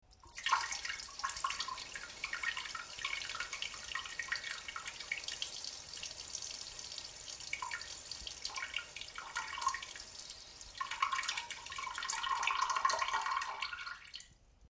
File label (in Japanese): ぱしゃしゃしょー おしっこ